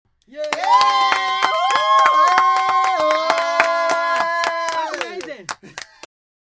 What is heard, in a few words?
applause